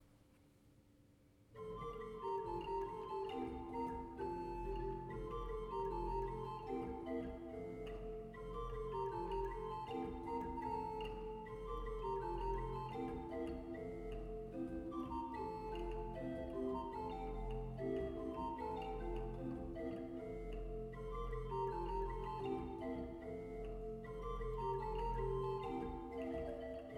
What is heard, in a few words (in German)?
Walcker 6 I P, orgel